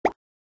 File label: button; 3d royale